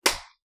smack; shweets sim

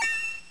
level Up Ready; shweets sim; snivy

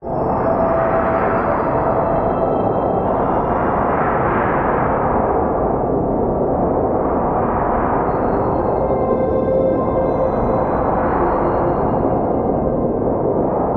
chill; calamity-cobra-2

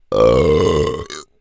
burp; shweets sim; braixen

